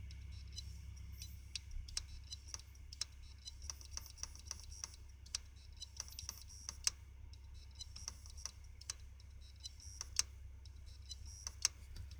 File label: hdd sound